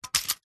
office stapler mechnanism metal movement clicks short